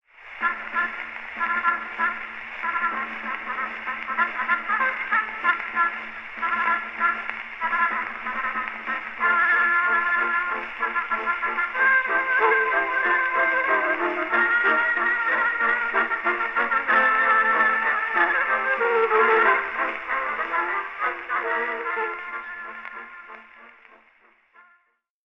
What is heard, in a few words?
Yankee acoustic